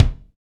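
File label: bass drum, Roland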